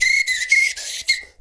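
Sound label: foudang groove; Best Service; World Colours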